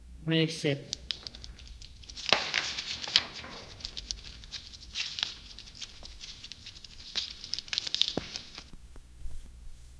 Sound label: quiet lecture hall with, school bell